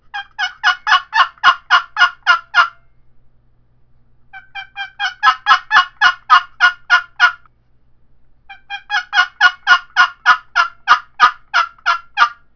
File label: poplar cherry box call yelps, turkey; Southland Sounds